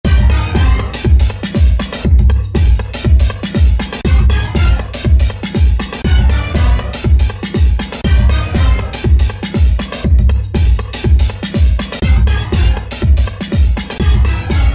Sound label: Hit House; Roland SR-JV80-11 Techno Collection